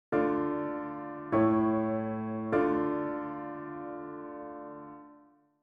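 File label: D minor PAC; melodic dictation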